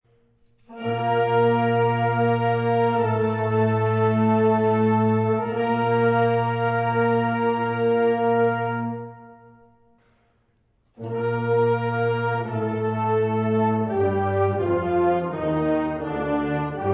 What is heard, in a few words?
Concert Tuner Bb